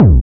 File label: clear bass drum single shot c key